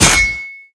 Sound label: ring, large blade; weapons; dota